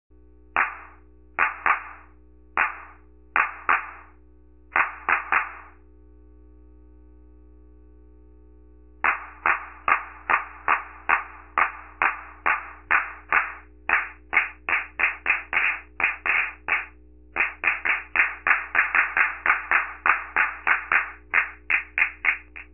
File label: claps with mods; dr110